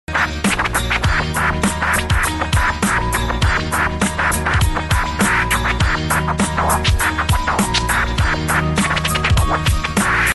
Melody Scratch

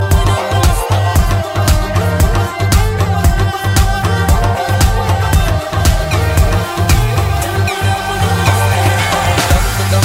Band Baja Barat

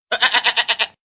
Simple Goat

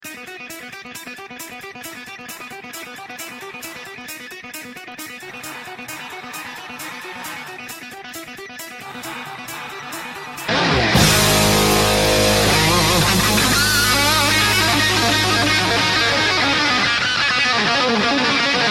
Rock Guitars

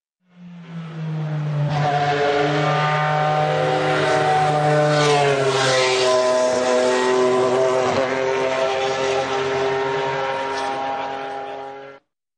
Porsche Gt3 Racing

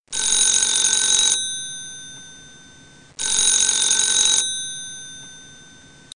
Dring Ring

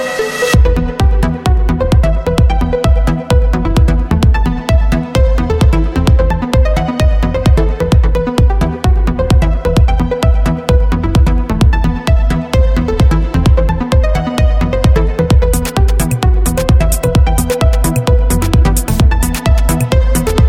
Deep Prog

